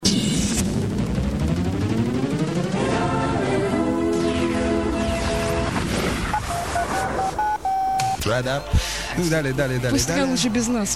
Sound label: jingle